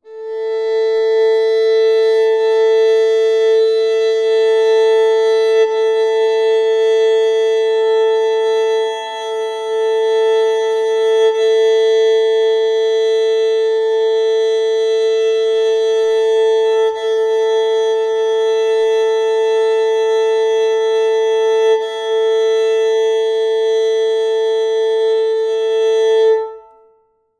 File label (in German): geige a e doppelsaite stimmen